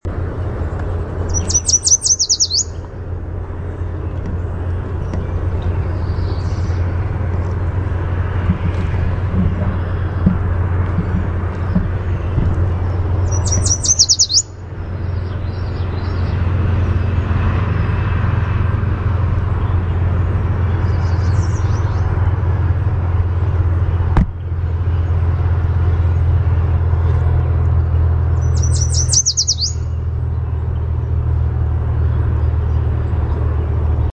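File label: Yellow Warbler song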